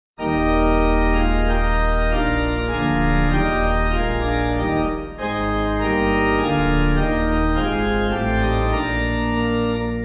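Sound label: pipe organ hymn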